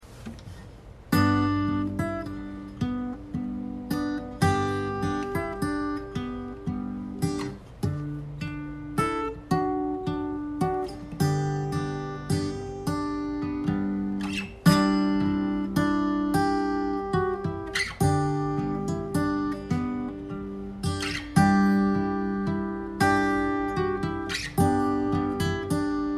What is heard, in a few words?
silent night guitar only